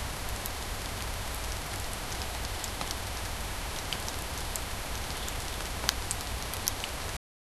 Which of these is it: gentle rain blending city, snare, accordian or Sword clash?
gentle rain blending city